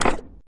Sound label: log jump; CrossyRoad